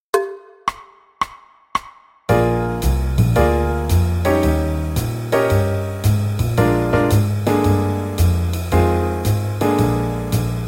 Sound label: 12 Bar Blues Jam Track